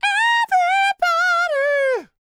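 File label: falsetto, R&B Dance; Spectrasonics Vocal Planet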